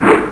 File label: catapult launch; Ferazel's Wand Demo